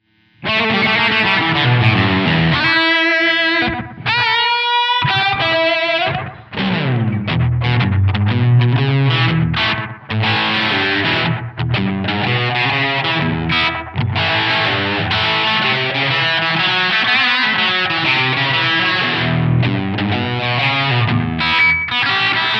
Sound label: slap; Damage Control TimeLine